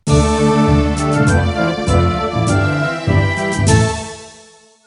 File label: win; Mobilising Armies; RuneScape 3